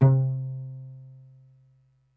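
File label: string bass C3, pizz section; Greytsounds VOL-1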